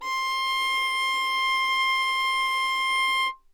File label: violin